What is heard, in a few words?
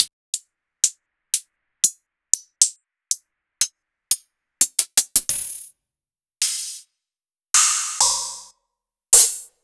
Hi-Hats